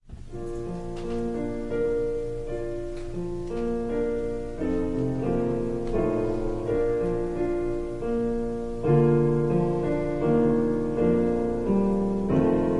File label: Piano prelude